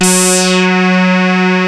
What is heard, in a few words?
OSCAR F#3, synthesizer; Hollywood Edge - Giorgio Moroder Rare Synthesizer Collection